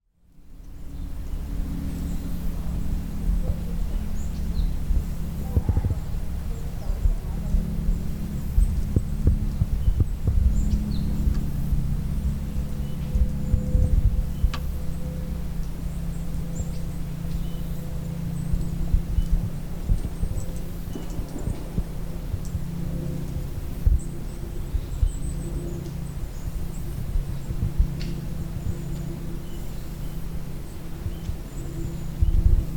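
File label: sound of an airplane high in the sky; 空高く飛行機の音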